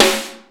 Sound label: Snare Drum